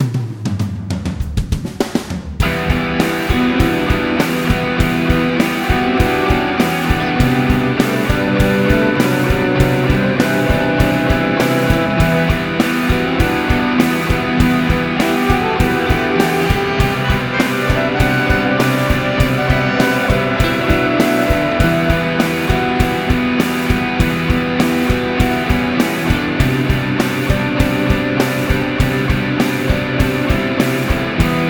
Rainy tuesday remix

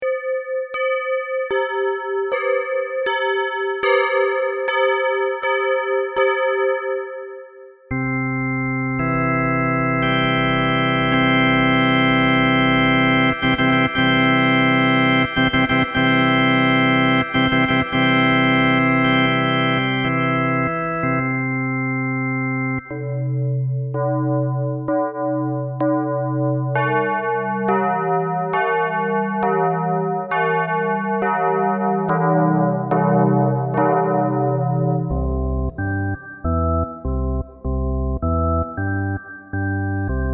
fanfare

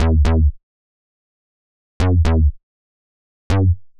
phat bass

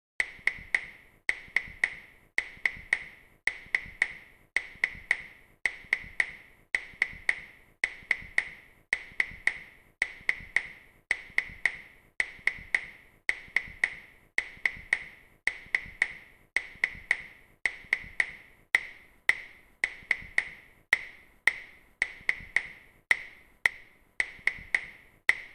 claves

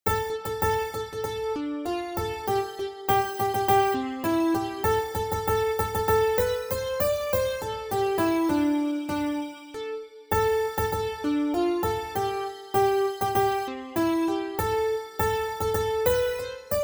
music

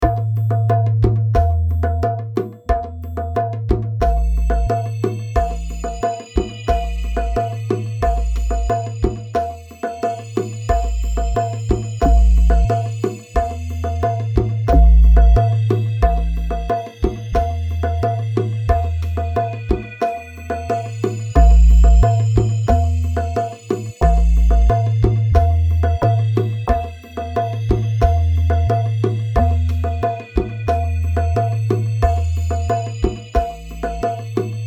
electronic chill out

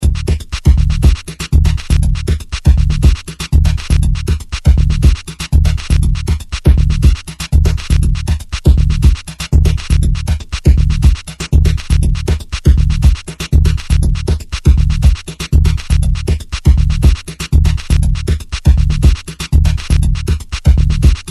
Batucada; OpenSounds Evangelos

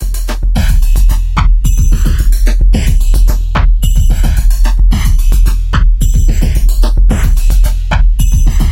bassline drumloop; bassline project

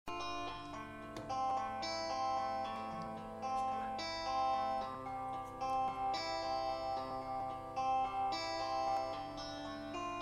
Guitar

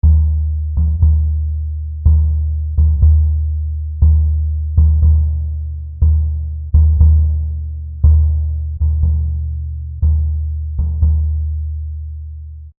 Bombo

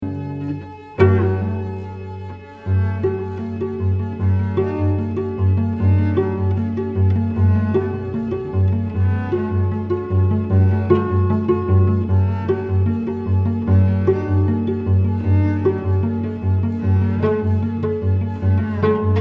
Cello

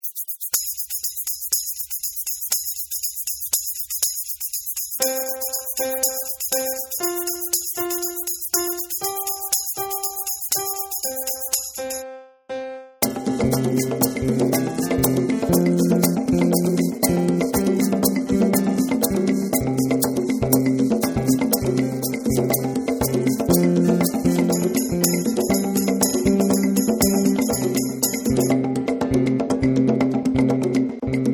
calypso

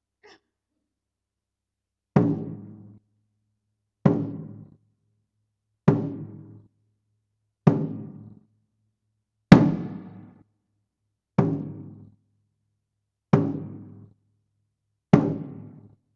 Drum

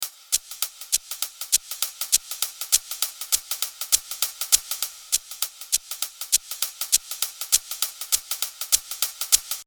Percussion

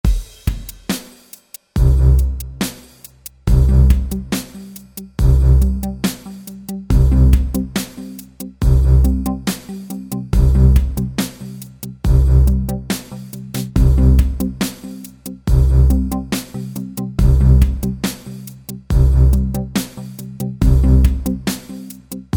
trance&drums

